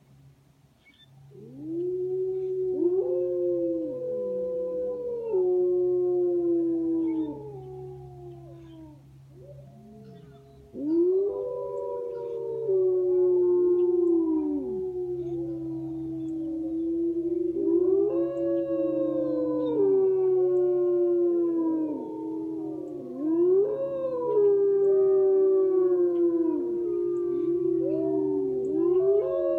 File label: Wolves Howling